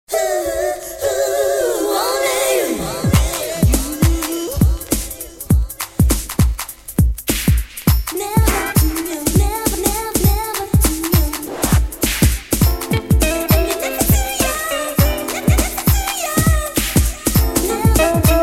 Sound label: Radio Soul mix